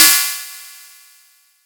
topout; quatuor

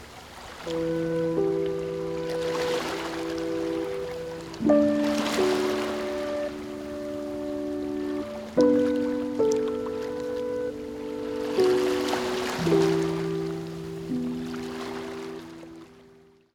waves and tears; daedalus

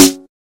garbage in large; quatuor

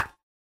rotate; quatuor

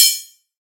exchange, Falling blocks; quatuor

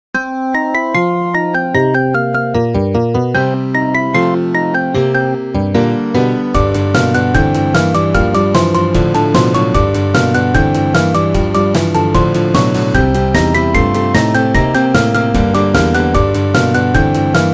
4 Riff Blues

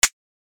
clutch; quatuor

